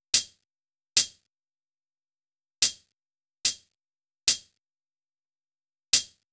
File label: 142 Am